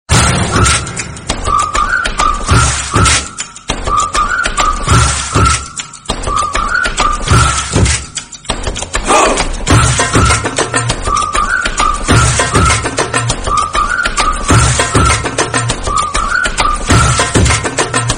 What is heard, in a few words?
Whistle Ringtone; Dhoom 2